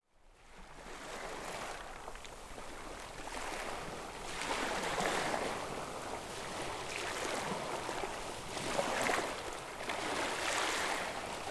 beach tide on rocks end of trail; seahurst

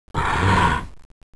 hellhound pain; monsters

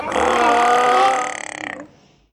squid idle; npc

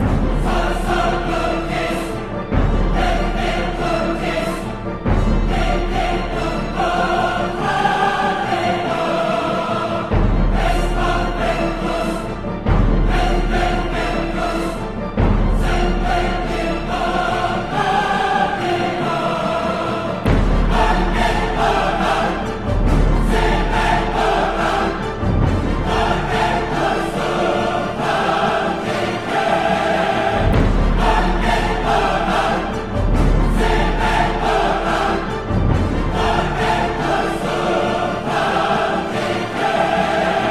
o fortuna mid loop; ragemap2012

